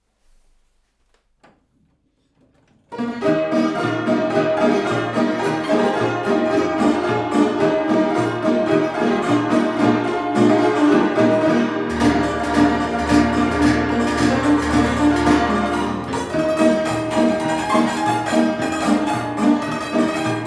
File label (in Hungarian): orchestrion; verkli hang muzeum; eldorado 9; femhengeres; 2 tekerccsel 6 dallamu; etzold popitz; 1907 lipcse